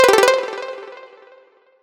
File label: combo; quatuor